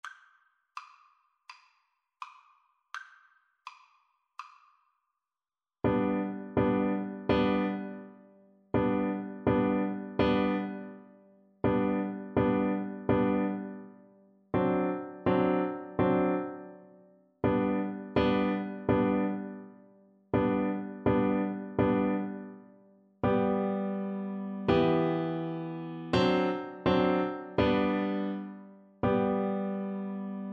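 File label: viola karaoke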